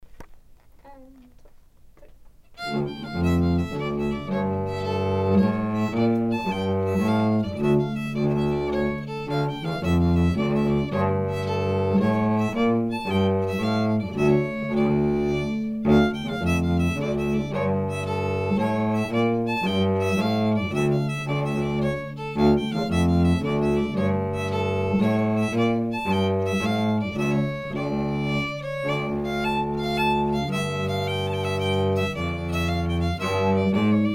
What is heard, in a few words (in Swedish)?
Cellostämma klockarpolska